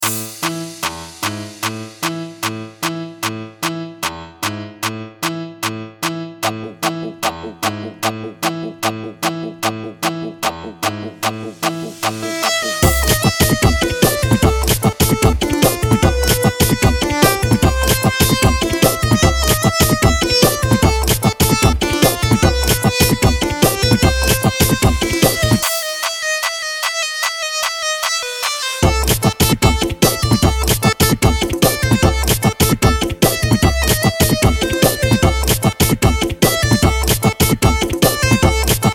quinta sinfonica do funk

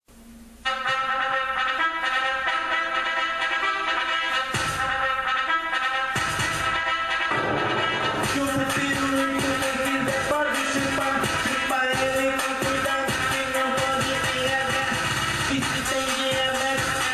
funk ao vivo; cantor e compositor

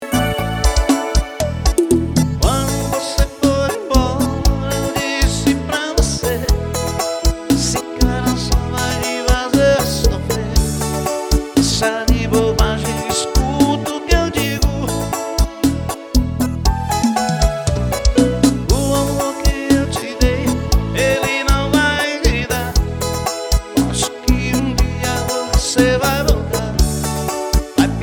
serestão brega ao vivo

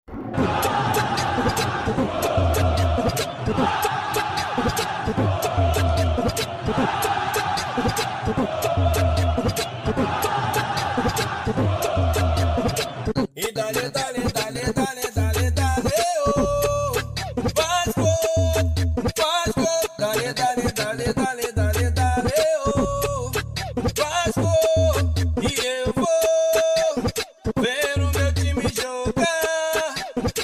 grito de torcida

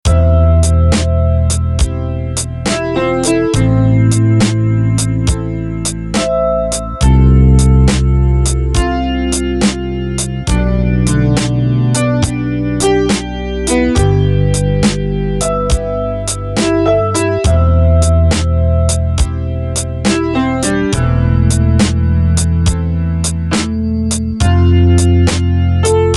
instrumental country

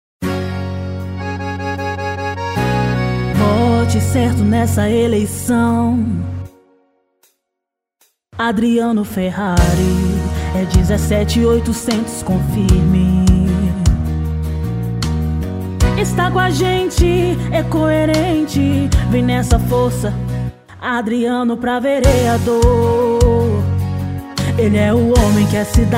jingle; vereador prefeito eleicao campanha politica 2024

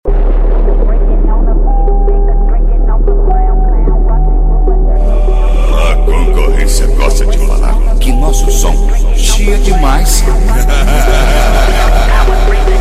som automotivo

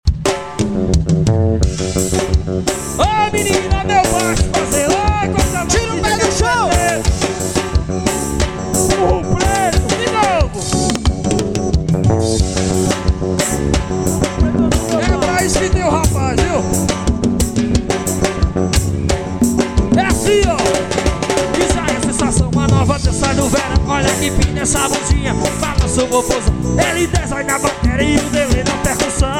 forro swing

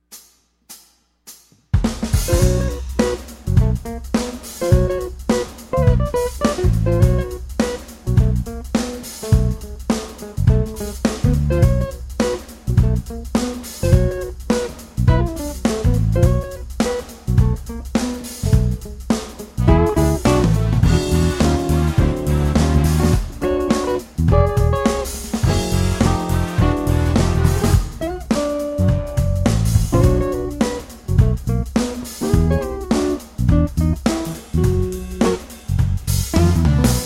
Levada de funk

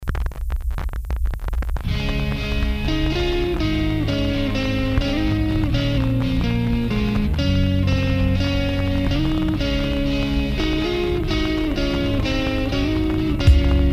cancao do autoamor guitarradas lo fi version

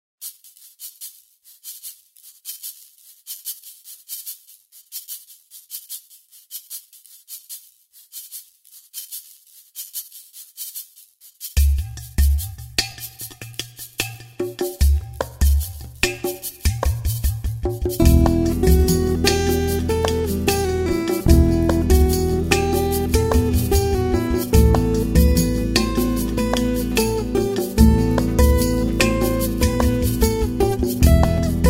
Guitarras Falam

